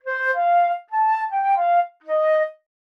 flute

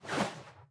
whoosh

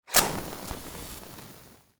match

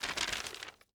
paper

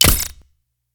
katana

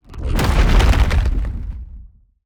ground divide rumble

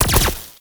staff cannon

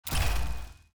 ui overweight

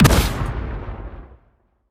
uav bomb

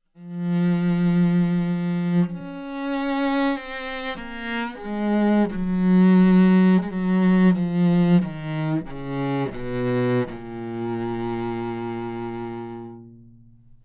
cello

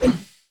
right whoosh

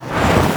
robot fire cast

